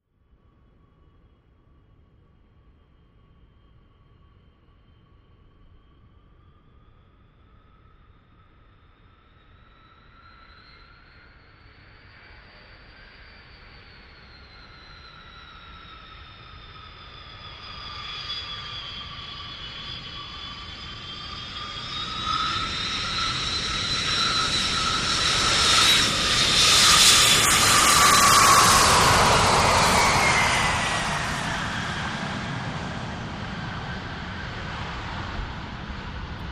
Lrg Jet Landing Busy

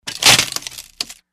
Step Picture Frame, footsteps

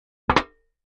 Aluminum Pan Buckle; barrels buckets & cans

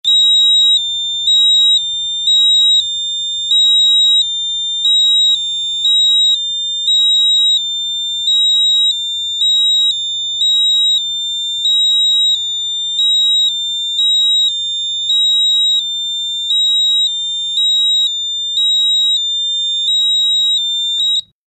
Fire Alarm High Beep; hi-tech-various

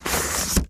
paper fold; paper mvmt

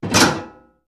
Switches, Industrial

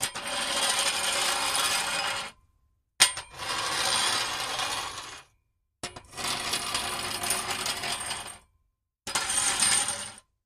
Rake: Cement

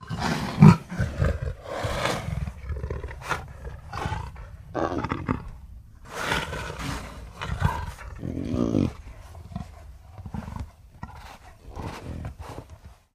Leopard Grunt Throat; big cats

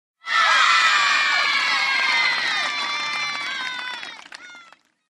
Young Kids Shouting, cheer

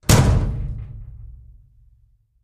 Vault Inner Door Close; safes & vaults

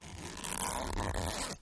zipper; zippers

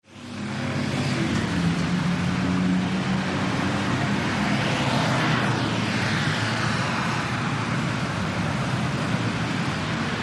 Traffic 57th St NYC, big city

